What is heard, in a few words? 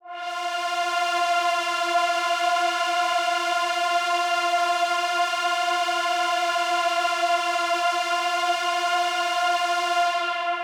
Big Pad F3; KG MegaSaur